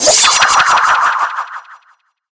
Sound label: MagicItem GhostSwords In